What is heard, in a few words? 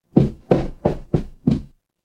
footsteps wood